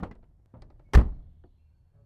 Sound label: Car Door; FXHome